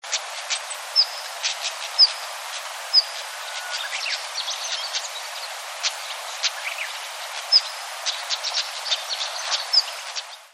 nobita

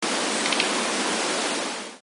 mugimaki

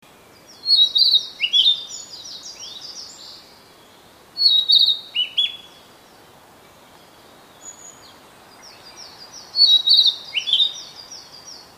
kibita

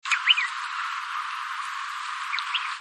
uguis

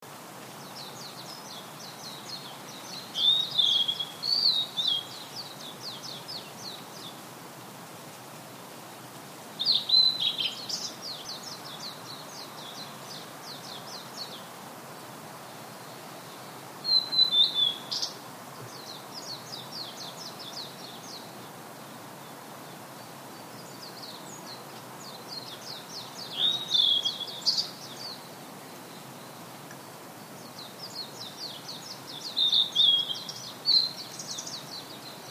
oruri

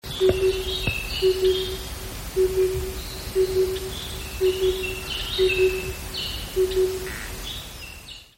tsutsu

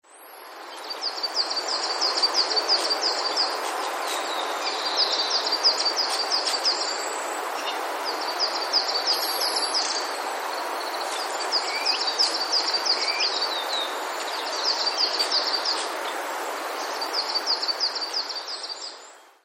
kibita

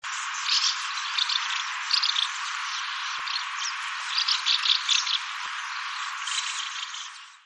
iwatsuba

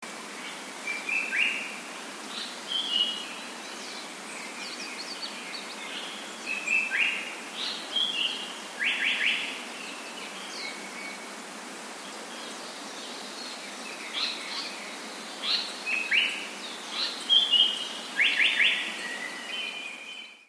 sanko

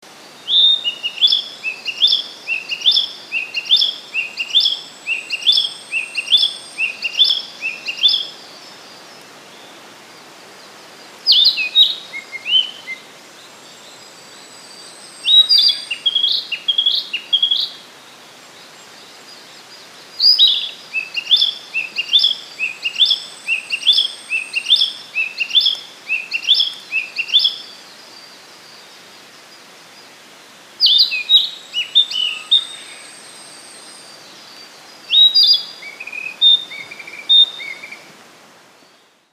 kibita

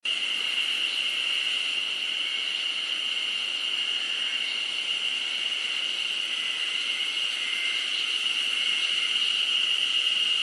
harusemi

